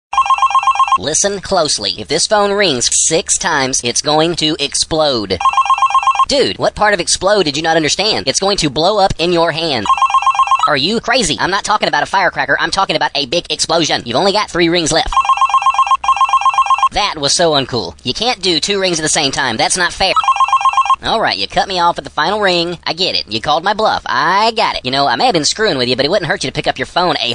Exploding Phone

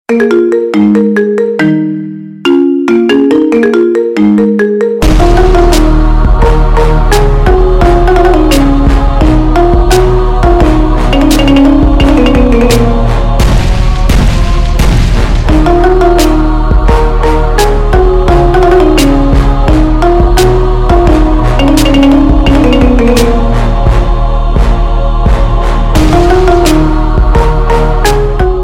marimba plevne

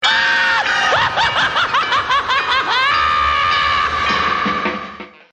Maniacal Laugh

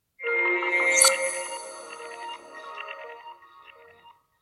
noise

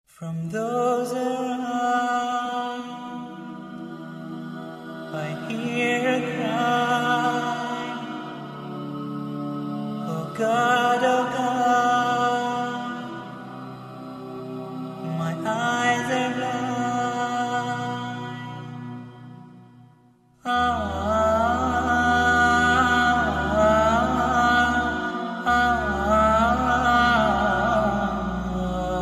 Last Breath

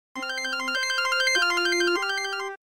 Interlude Motorola

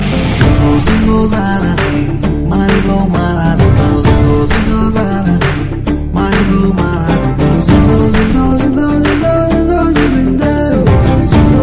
Music

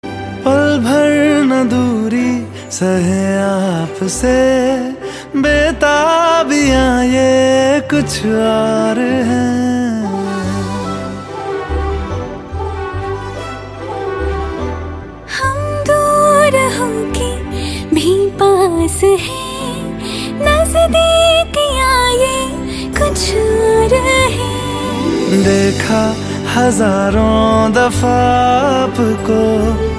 Hindi Love Song

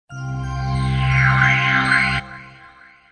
Android SMS

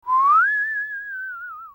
Whisle; TF2 Spy

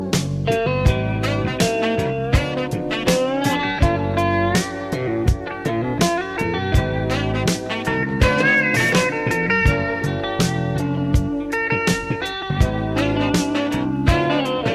Guitar

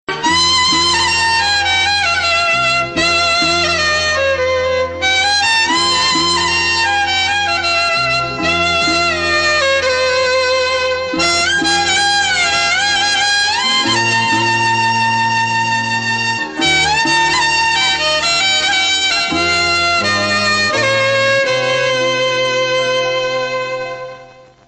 Bgm; Appu

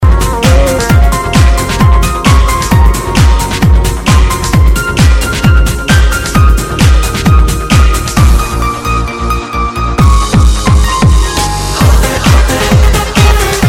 Instrum

